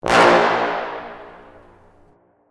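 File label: Sting Small Brass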